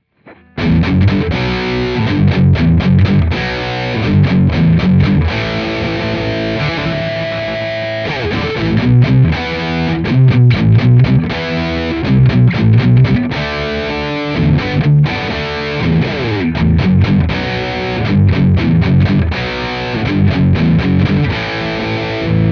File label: gtr el diablo; crowley and tripp